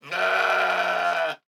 goat hurt; battle

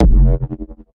wave hit; CrossCode; battle

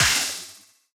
ferro cold hit

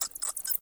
batsqueak; World Of Goo

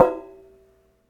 metal collision mid; The Swapper

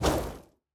throw ball heat; CrossCode; battle

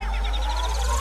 pillar charge; battle

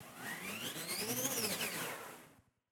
hedgehog spin; battle

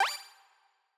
take call

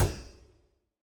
ball bounce; CrossCode; battle

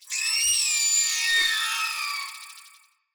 ant lion scream; boss

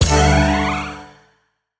element shield activate

